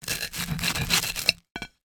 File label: toast cut